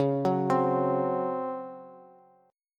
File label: Db Major 7th Suspended 4th Db down Bass; arpeggiated; JGuitar